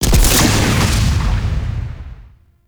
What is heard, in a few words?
Scythe Shot; Weapons; Melee; Warframe; Archwing; Exalted Archwing Scythe